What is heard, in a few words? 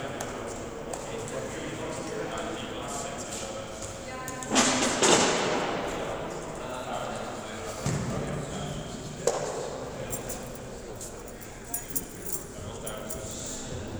foley